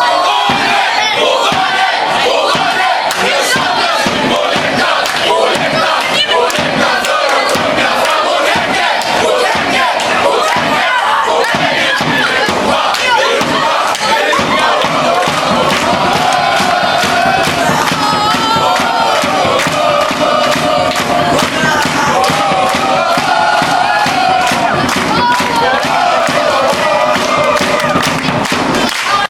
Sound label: chant; hacken